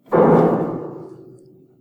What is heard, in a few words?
piano